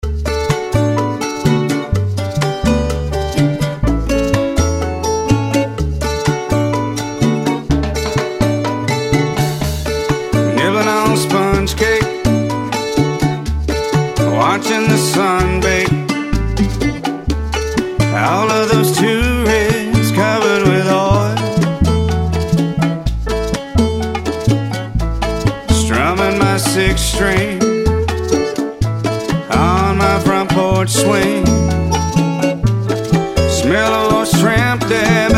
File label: STYLE GUAJIRA